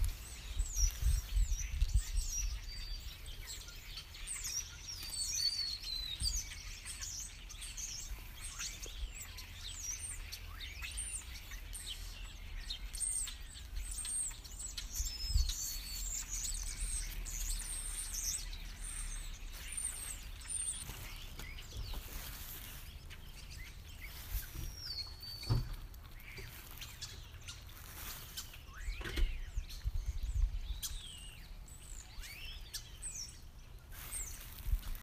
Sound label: birds on the walk